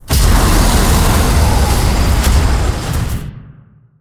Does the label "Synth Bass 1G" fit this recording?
No